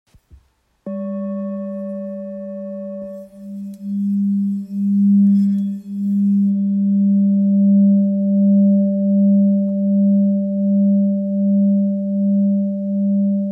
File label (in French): Bol therapeute sol